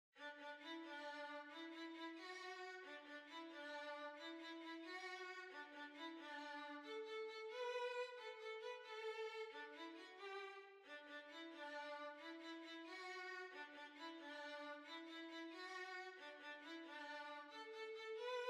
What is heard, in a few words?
Violin